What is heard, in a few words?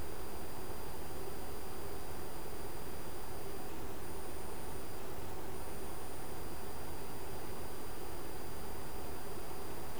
aircon